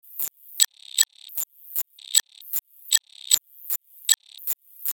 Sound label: DIGITAL COMPUT, Digital Computer Readout Clicks; glitches; Blastwave